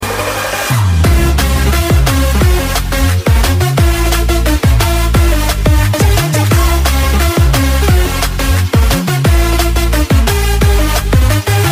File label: party music